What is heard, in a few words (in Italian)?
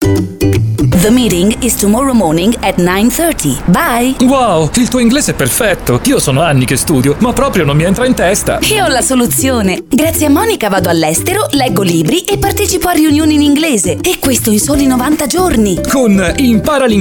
Jingle Inglese